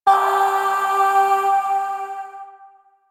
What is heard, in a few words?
Void Shawzin Oct Two G; Warframe; Zariman